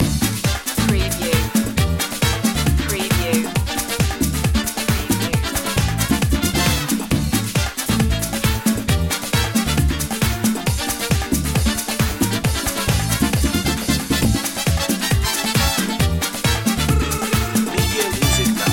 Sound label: playa de samba